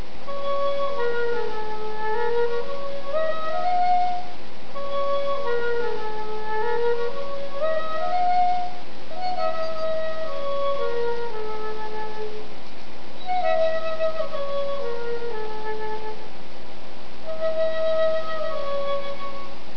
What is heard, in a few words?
flute song solstice